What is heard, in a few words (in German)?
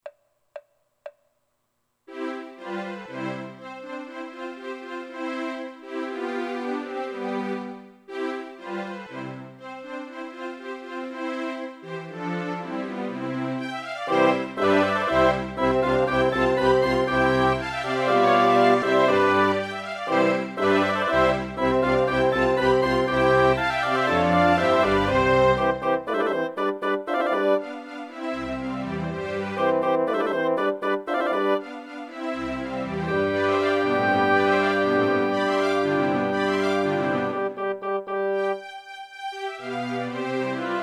ob konzert orchester; mozart